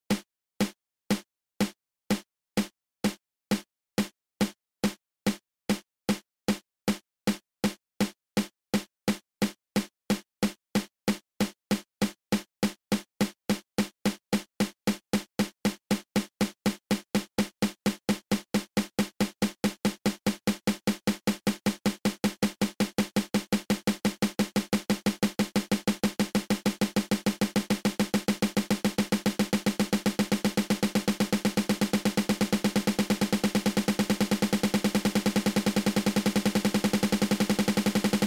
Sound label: Double Stroke Roll open close open